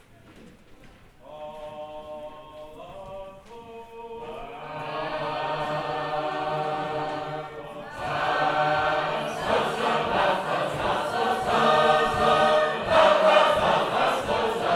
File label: Sacred Harp; Cheves